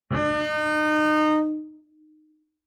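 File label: Bass arco fortissimo sul G Eb4, Double Bass; MIS Pitches - 2014; Strings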